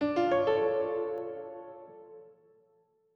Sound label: Mystery Piano